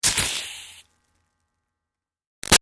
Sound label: Sizzle Iron Electrical Zap; Zaps; Electronics; Big Room Sound